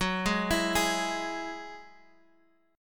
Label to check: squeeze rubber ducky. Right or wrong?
Wrong